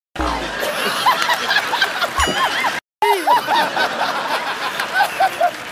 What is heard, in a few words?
laughing; baba ramdev; audio meme